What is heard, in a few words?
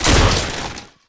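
impact horse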